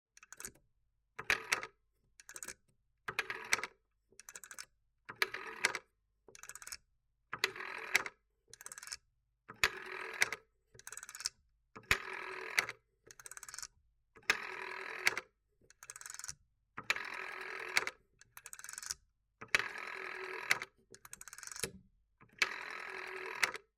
Siemens W48 Wandapparat 1934 - Slow Dialing 1-0, Vintage Telephones; Sound Ex Machina